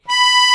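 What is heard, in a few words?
accordion B 3; Best Service; Ethnic Journey Sound Cube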